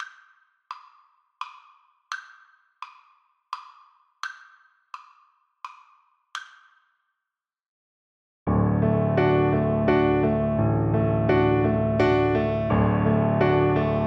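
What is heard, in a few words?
VLC karaoke, Cello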